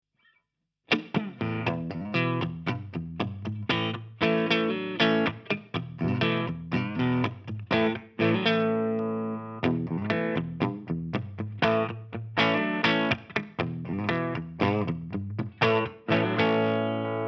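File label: Schecter KM-6 MK-III Clean, guitar; Keith Merrow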